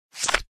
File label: Open Card